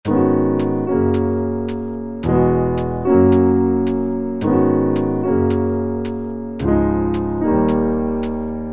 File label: Piano